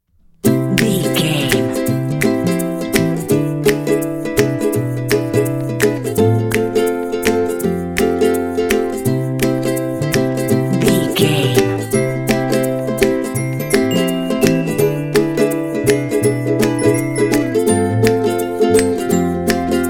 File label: upbeat ukelele full mix